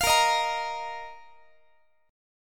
Bb Suspended 4th Bb down Guitar; strummed; tuning 4A,4E,5A,5D,5G#,6C#

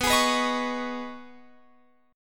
B 6th Add 9th B down Guitar Open F; B6add9; strummed

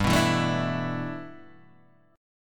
G 6th Add 9th G Guitar DADGAD, chord

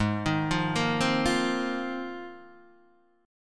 Ab 6th Add 9th Ab, Guitar, Open D

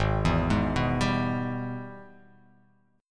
G sharp Minor Major 7th Sharp 5th G sharp down

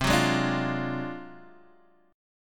D 7th Sharp 9th Flat 5th C, chord

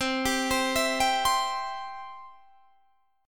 C Major, C, down, chord; arpeggiated

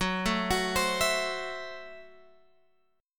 F sharp Minor 7th Flat 5th F sharp Guitar Open G

